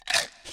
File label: Serpent Chomp